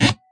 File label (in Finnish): narahdus, Clangy Crunchy Foundsound Kit